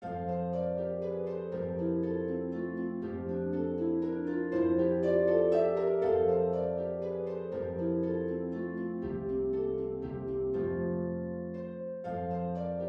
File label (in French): valse fa majeur; beethoven ludwig van